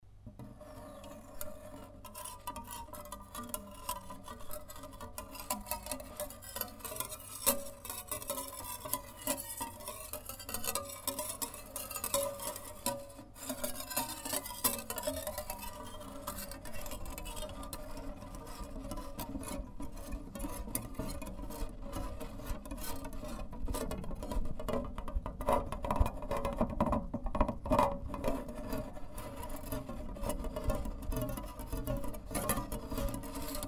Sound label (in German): sprudler topf